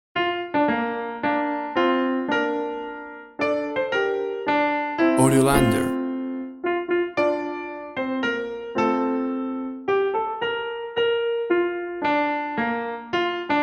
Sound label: USA National Anthem Vocal Arrangement; USA NATIONAL ANTHEM PIANO